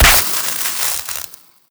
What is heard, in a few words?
spark sparkles